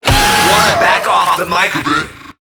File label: Infested Lich Pain, Barks; Warframe